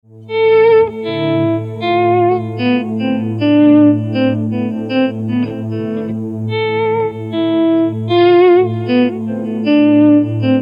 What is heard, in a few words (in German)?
Variation A Moll Scale